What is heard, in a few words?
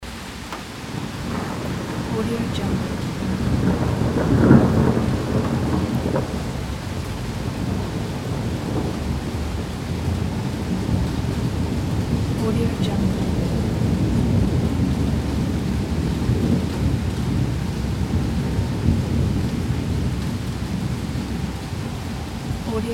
rain with thunder loop